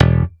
E0 SHORT, STIK BASS; D-STIK BASS1

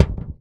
Crunchy, Kicks; Airwindows

